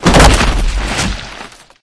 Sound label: Character Body Impacts